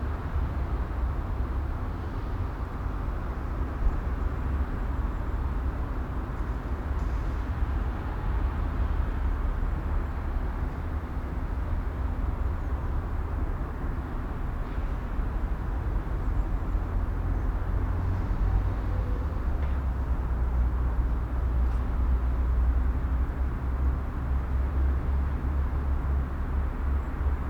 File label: Urban din day traffic roaring; urban and suburban; boom library